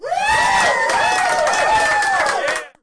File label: crowd cheering